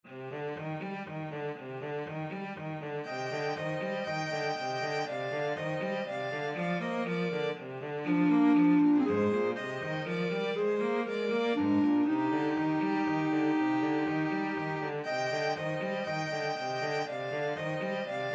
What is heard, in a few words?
violin clarinet cello; grandos spanish dances no2